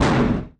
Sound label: car metal large impact; factorio 2.0.55